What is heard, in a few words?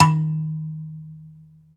Bass Kalimba Eb; Multisamples; Digital Sound Factory Planet Earth